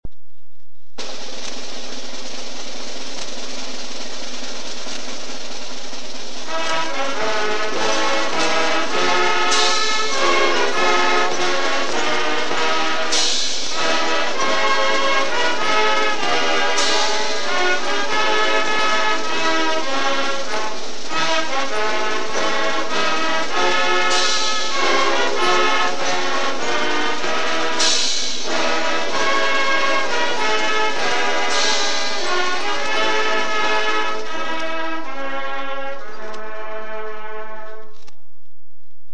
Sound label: Senior High Band; Star Spangled Banner; razorback sounds of 1971